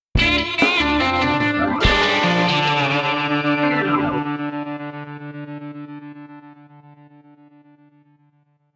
Big Wave Beach (Victory!)

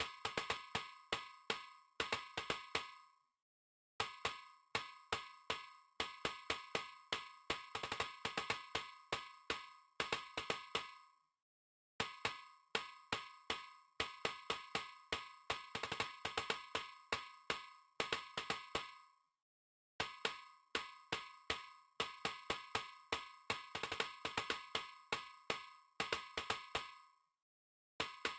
PERC

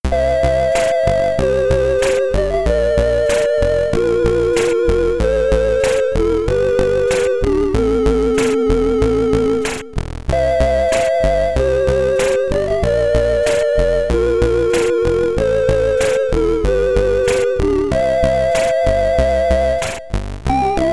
Game Over SID Stereo; Great Gurianos; commodore 64

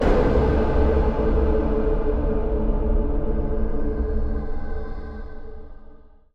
new level; the madness of little emma

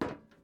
metal step; teardown ps5 windows xbox series xs gamerip 2022

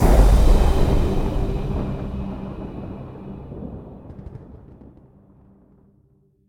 Combo G; Mixolumia

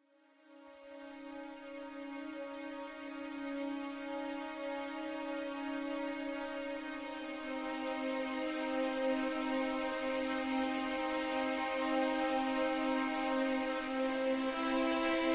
Ambient

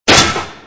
Gun Impact

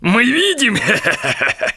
Emote Effort; Light Street

